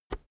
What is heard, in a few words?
keyboard external enter push soft